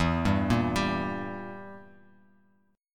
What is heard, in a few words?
E Diminished E, Bass Standard; Edim arpeggiated; JGuitar